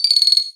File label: Multiple UI Beeps